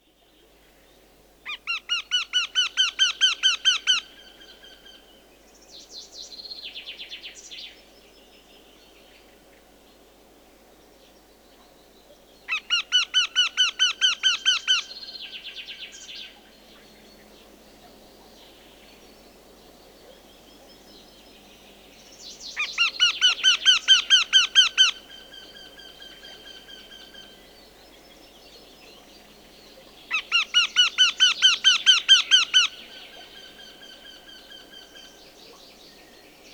Wryneck, birdsong

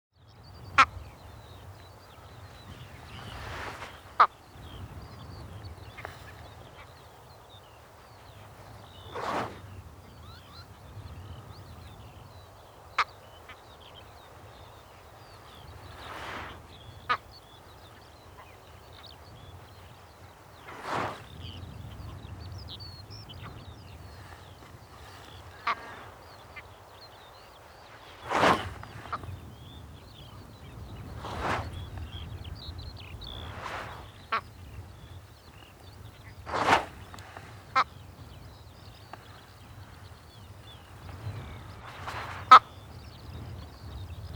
Great Skua

